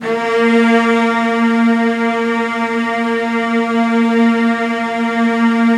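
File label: CELLOS C4, Strings; ILIO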